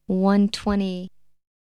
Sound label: Vocal; ZERO G - Datafile DISC 1